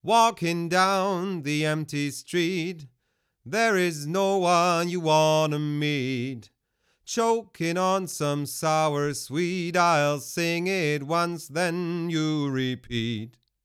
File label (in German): Vocal ohne ONE LA; IGS Audio ONE LA 500